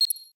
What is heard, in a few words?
tech oneshot, office